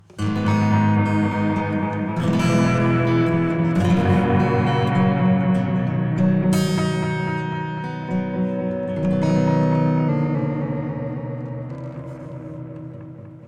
acoustic; Zen Delay; Psychedelay

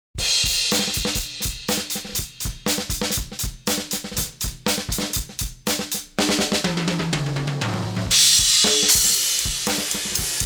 drums EQ Low cut; Titanium 3

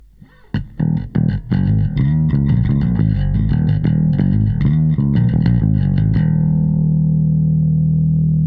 Bass; smart comp; FETpressor

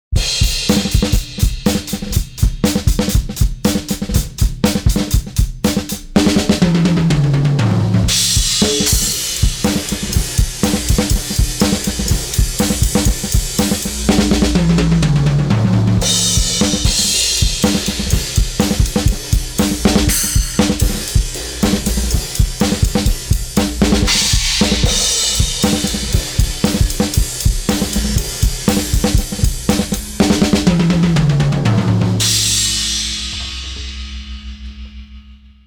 Overhead Excitement; Dynone

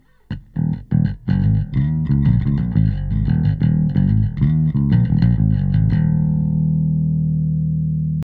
Limit bass; SSL X

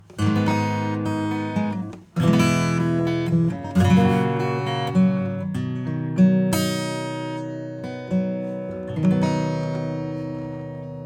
acoustic bypass; Cluster Delay

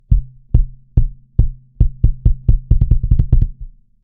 kick bypass; Comp FET-76

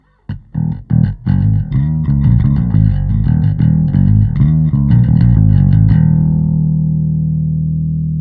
Bass preset Basic Bass; Neutron 4

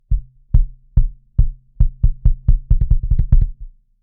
kick; Gem EQP

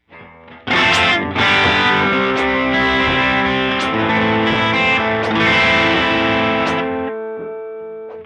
Moogerfooger MF-108S CHORUS LFO square

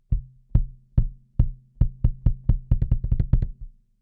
kick

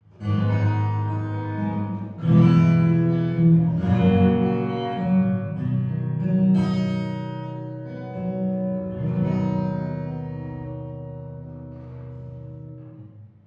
acoustic DIRTY TAPE

